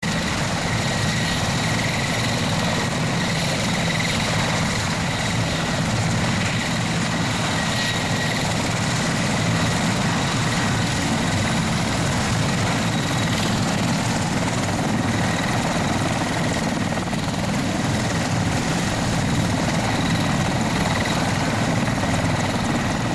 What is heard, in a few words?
mi35 engine